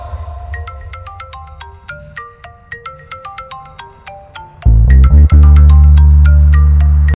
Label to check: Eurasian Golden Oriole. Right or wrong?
Wrong